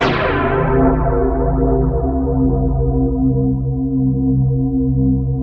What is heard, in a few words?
FM HIT; MELODICS